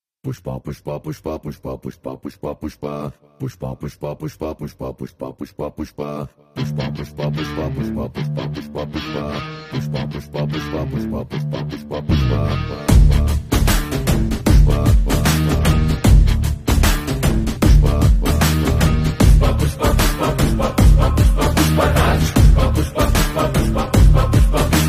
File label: bgm ringtone song; pushpa 2